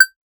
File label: a short punchy ui click, arcade